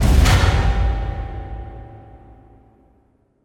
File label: String; Assassin's Creed Unity Dead Kings